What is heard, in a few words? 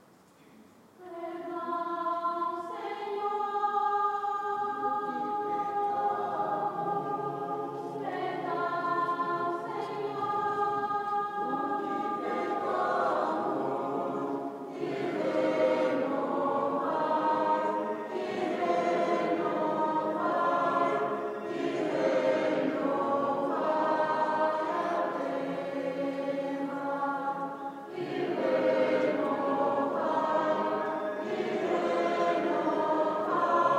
Windhoek Catholic church choir